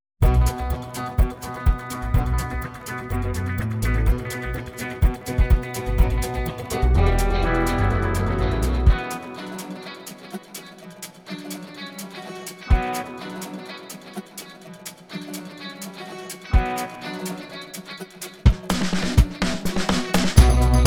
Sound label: suspense